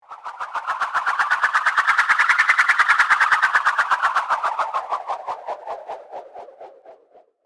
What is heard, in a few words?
sci fi swirl